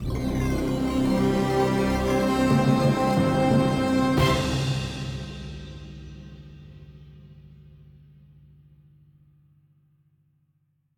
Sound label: Sting Mission Complete